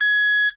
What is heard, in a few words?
beep